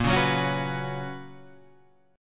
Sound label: B Major 7th Suspended 2nd A sharp, chord shape